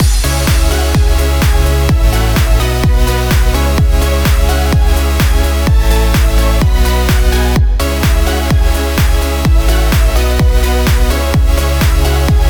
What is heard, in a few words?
positive upbeat dance pop loop